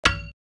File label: clang; fancade